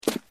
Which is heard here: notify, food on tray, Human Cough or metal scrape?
food on tray